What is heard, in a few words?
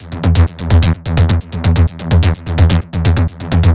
bass 128 E; Electro; bass loops; Anthemic